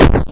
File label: death